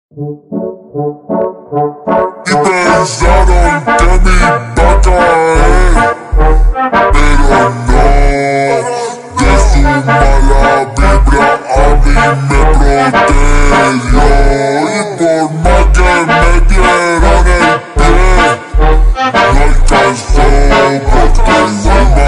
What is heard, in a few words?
funk do bounce slowed; corpse bride edit